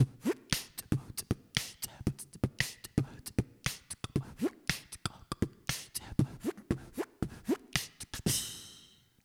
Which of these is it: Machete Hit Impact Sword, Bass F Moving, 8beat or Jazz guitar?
8beat